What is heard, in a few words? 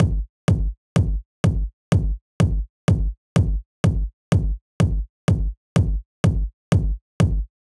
Kick, 125bpm, Nu Disco; Zero-G; Construction Kits; Speedy